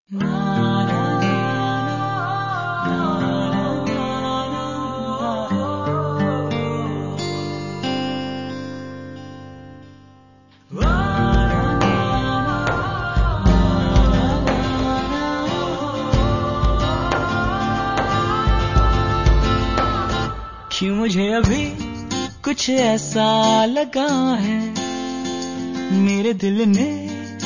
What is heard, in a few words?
Sad Version